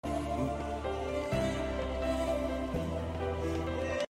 music, ringtones

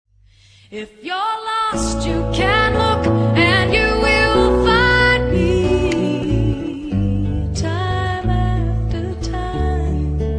acoustic

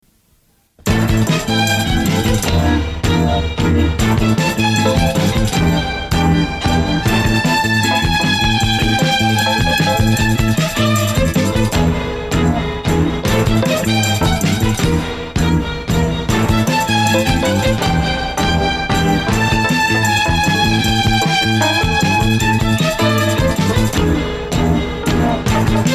BGM; VOLTES V

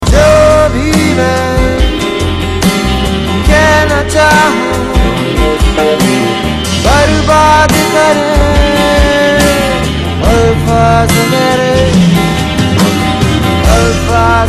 new rock star song

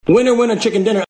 winner

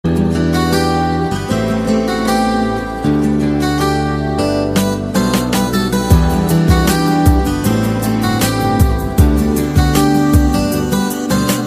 guitar love

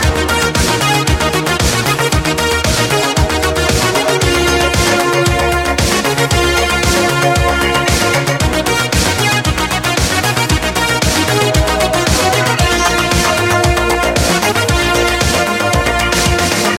80s classic

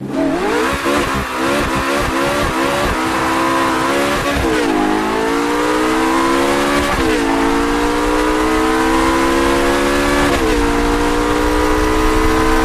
ferrari f430 audio

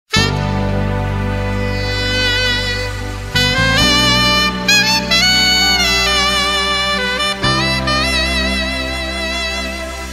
Sax Song

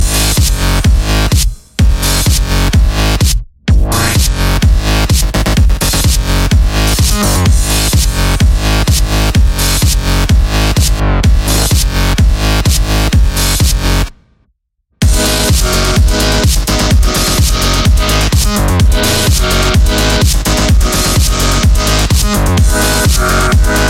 boom bass boom; dance

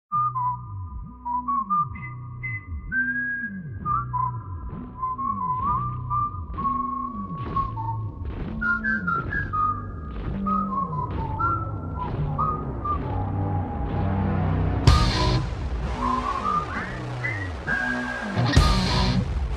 Whistle Rock

